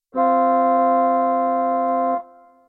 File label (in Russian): organ interval